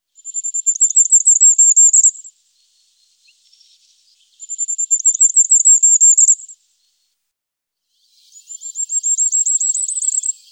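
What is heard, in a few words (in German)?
Sommergoldhähnchen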